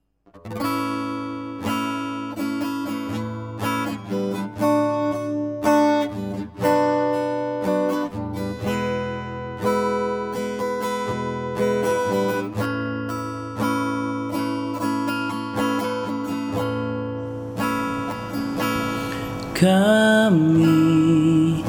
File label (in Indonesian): Hymne Pramuka; PERINGATAN HARI PRAMUKA KE-61 DI SMA NEGERI 1 KARANGDOWO